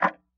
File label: Tick, Clocks Kitchen Clock